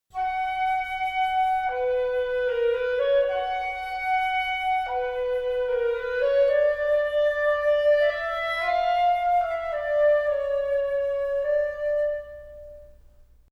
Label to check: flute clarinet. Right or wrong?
Right